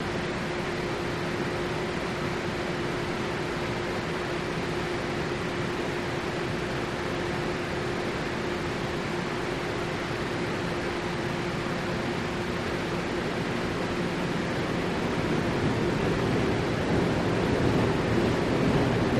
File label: Boat Engine Steady to Machine Movement